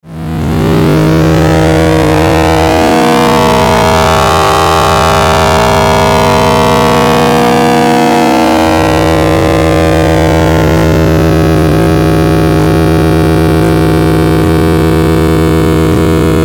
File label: Bed Short Electrical Buzz Hum Swell; Bed Sound Effects; Blastwave; Production Element Imaging Element Accent Transition